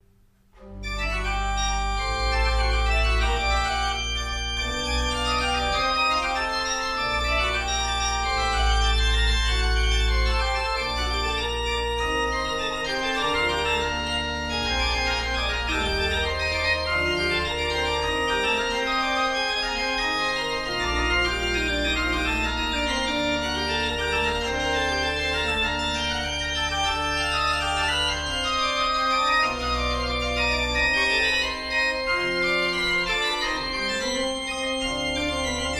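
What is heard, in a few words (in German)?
Godefroy Hartmann 17 II P, pfeifenorgeln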